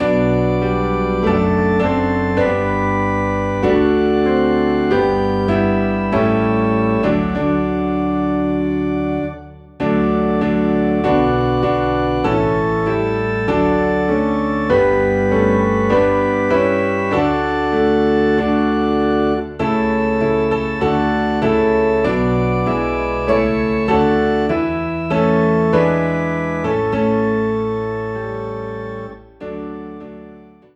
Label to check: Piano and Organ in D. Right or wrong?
Right